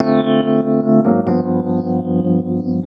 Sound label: Chords Tones; Equipped Music; Loungin House